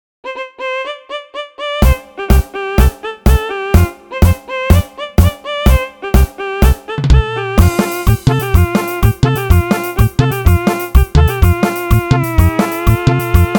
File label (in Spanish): Cumbia